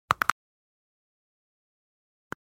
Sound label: Two Single Knuckle Cracks; Fights Bodyfalls